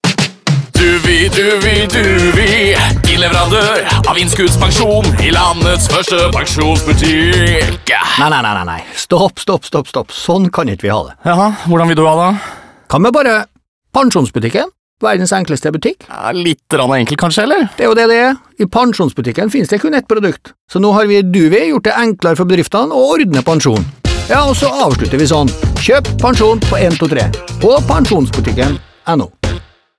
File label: Jingle